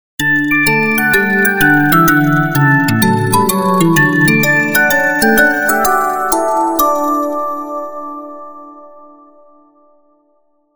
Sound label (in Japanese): 発車メロディ